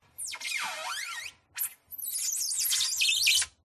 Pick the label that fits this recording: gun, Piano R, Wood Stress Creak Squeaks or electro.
Wood Stress Creak Squeaks